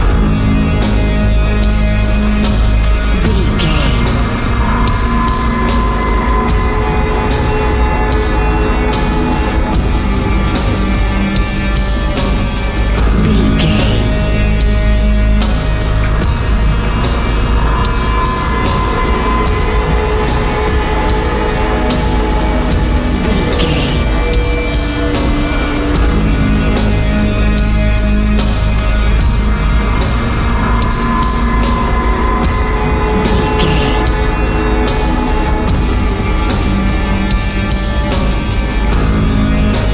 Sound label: harvest nightmares